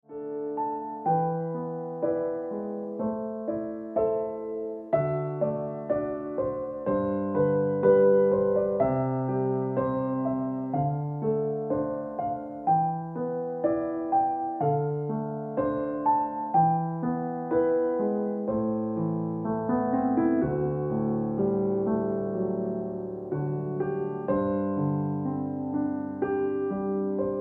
Ringtone Piano Music

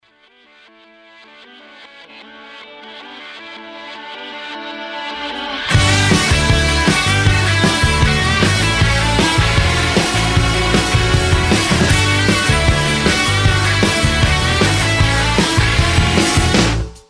Jumbo Mexican Ringtone

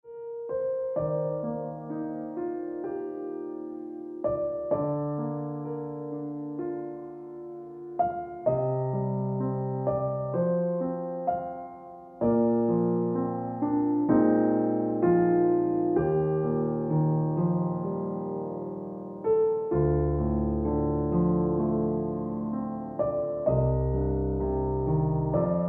Piano Music

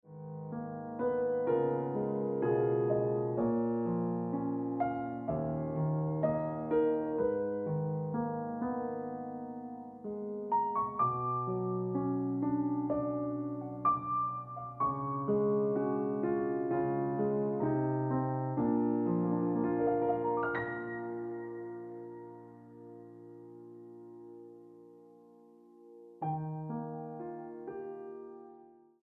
Piano Music

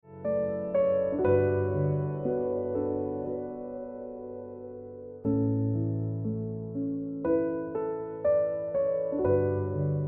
Piano Music

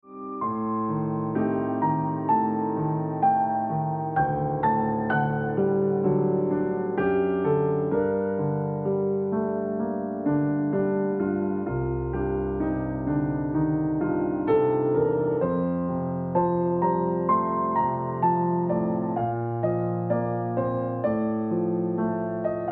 Ringtone Piano Music

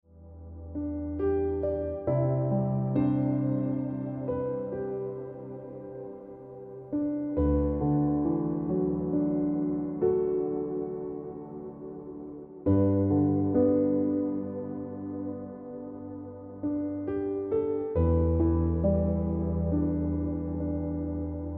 Ringtone Piano Music

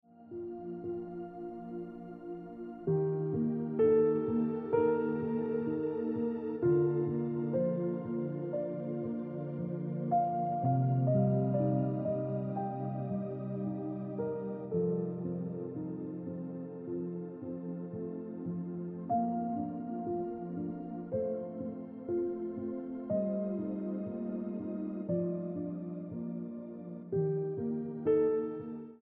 Ringtone Piano Music

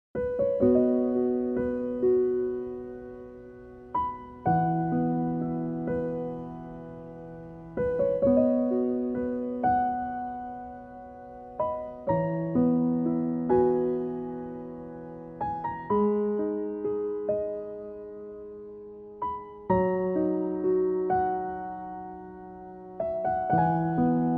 Ringtone

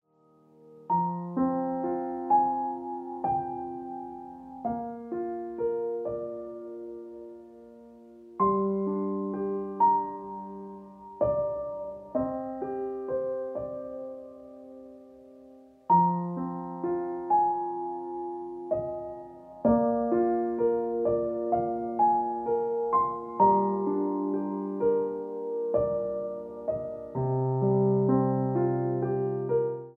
Ringtone

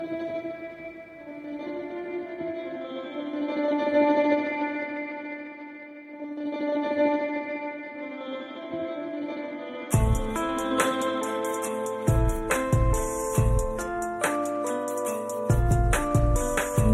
Turkey Ringtone

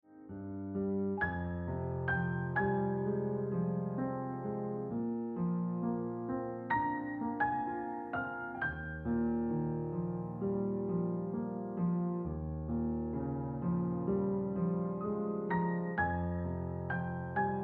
Gentle Ringtone Piano Music

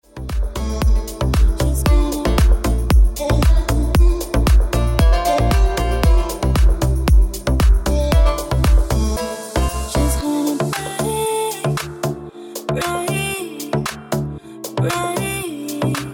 Ringtone

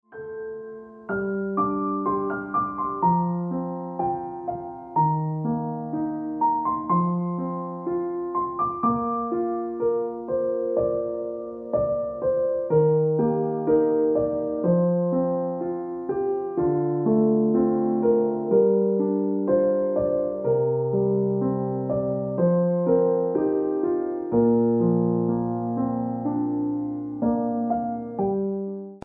Ringtone Piano Music

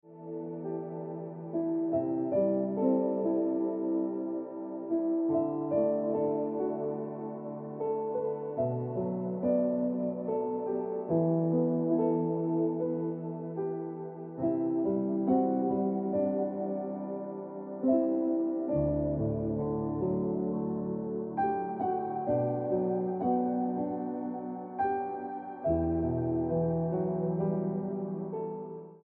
Piano Music

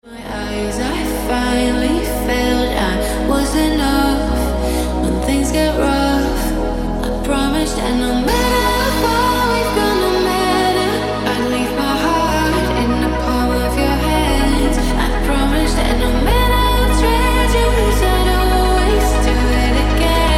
Ringtone Deep House Music

